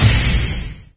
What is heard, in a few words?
sfx explosion; LG GW820 eXpo